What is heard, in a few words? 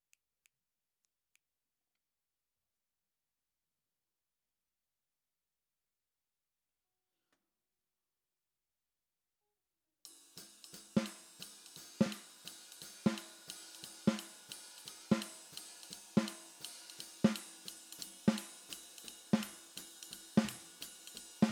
SNR